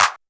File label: Handclap, TR626